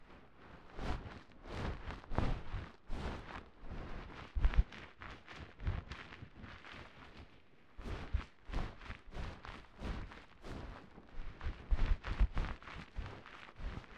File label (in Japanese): もこもこシャンプー